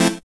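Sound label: synth filter, dance synth; Sound & Vision - Gigapack I CD 2 (Roland)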